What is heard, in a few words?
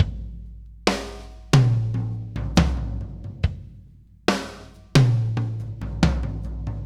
Loop, Drum Tools, Laid Back; Sonic Foundry (Sony Creative Software); Producer Dan